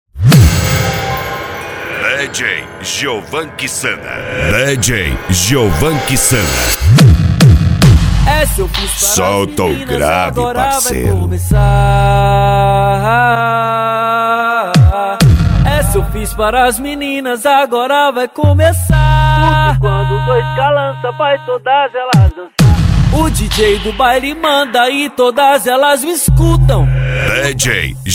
funk bass automotivo

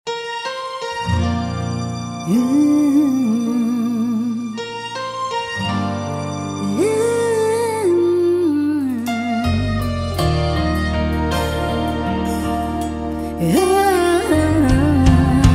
remix gospel

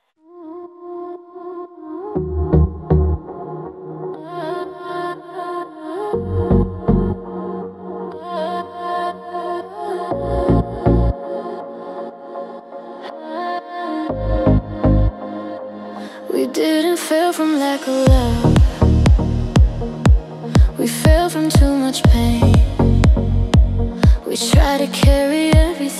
house

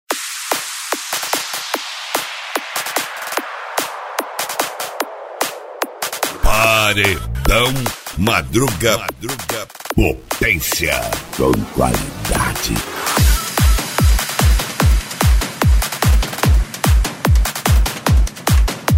psy trance; cd paredao madruga vol 02

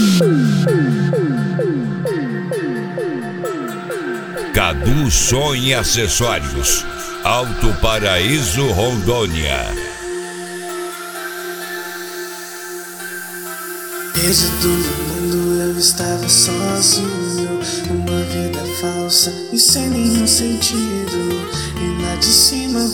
gospel

